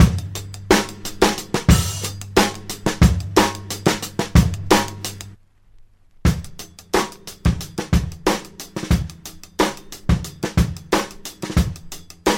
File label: staccato, drum loops, funky